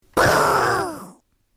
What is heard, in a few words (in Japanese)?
カイジュウの足音